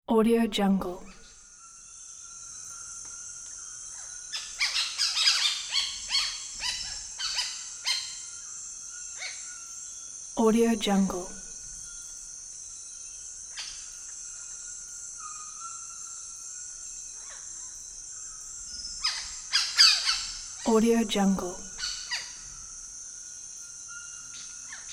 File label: jungle birds